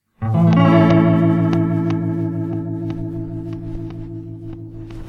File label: click